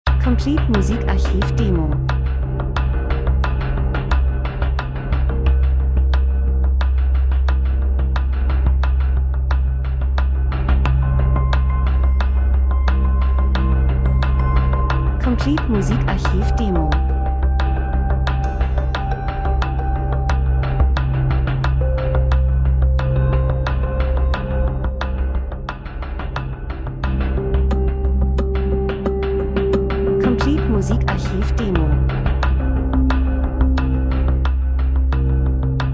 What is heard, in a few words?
drums; Elektro Rhythmus Gleichförig Fremdartig Urban Akzentuiert Besonders Offroad Fernweh Reisefilm Lifestyle